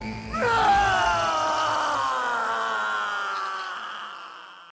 Pain Scream; Dante

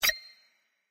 coin

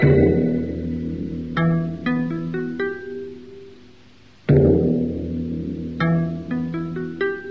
Drum Beat

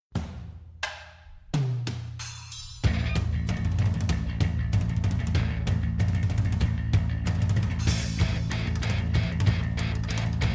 Tension

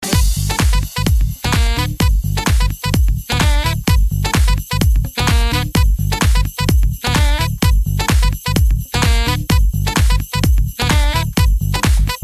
Jingle Bells

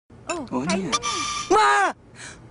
Buzz; Yahoo Nestea

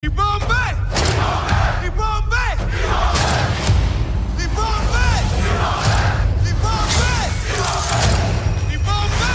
War Cry; Wakanda

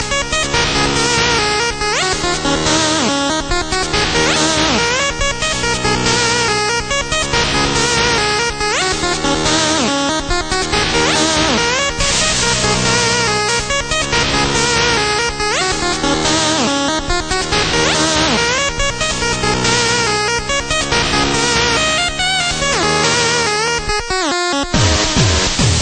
Chiptune

